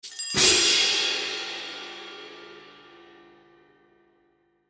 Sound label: Percussion Accent, Percussion Accent Chimes Cymbal; Musical; Cartoon